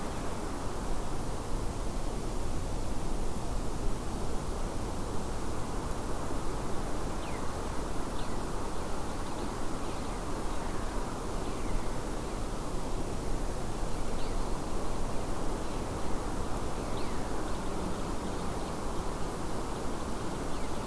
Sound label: WFR audio emfisis